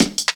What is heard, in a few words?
Foot Tapz Cut, Drum Loops 165.5; Zero-G Jungle Warfare 1, 2 & 3